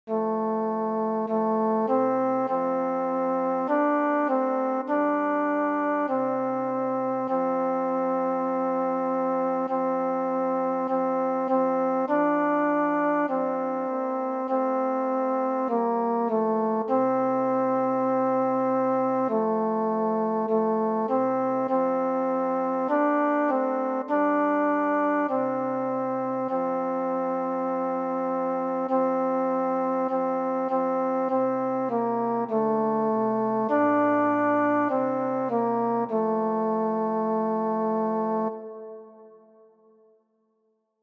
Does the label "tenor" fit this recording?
Yes